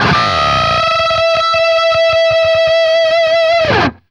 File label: DBLE E 4 CUT, guitar doubles; East West; Steve Stevens Guitar Samples Collection